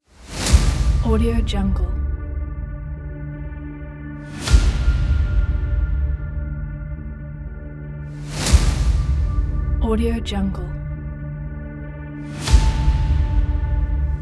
intense hybrid trailer; brightnotessounds

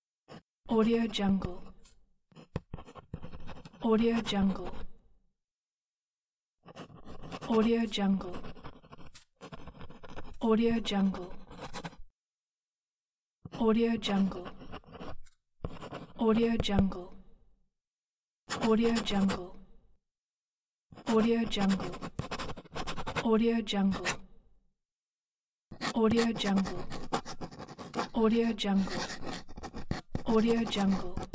writing on a desk; eternal-future-productions

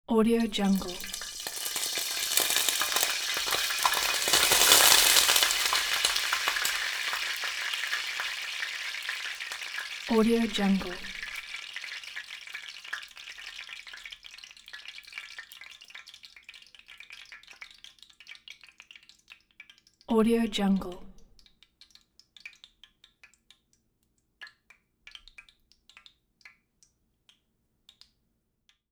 oil sizzle in pan

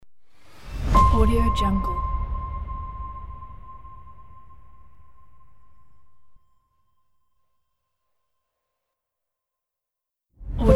piano hits

